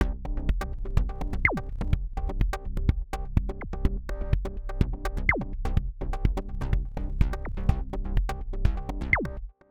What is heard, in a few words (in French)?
Exemple de multi patch sur Omnisphere